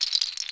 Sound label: HUSK SHA, CAMEROON; AKAI S6000 CD-ROM - WorldSounds; CAMEROON PRC